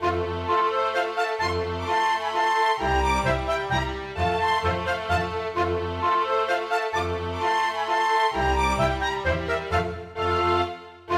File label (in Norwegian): Polka Masurka; storgårdsmusikk